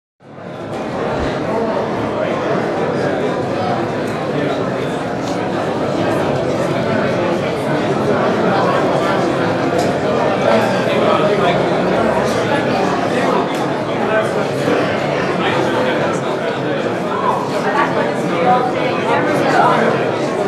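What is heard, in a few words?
Interior Crowd Mixed American, chatter walla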